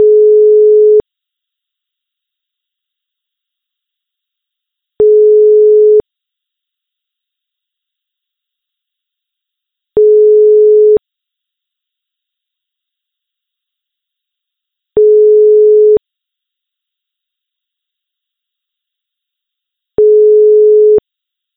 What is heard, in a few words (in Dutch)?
Overgangstoon